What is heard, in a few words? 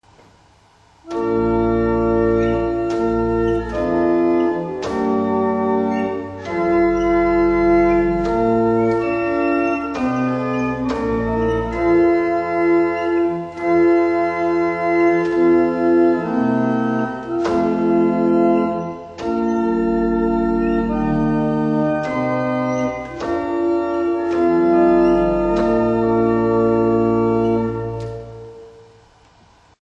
Double Chant, barrel organs; Tittleshall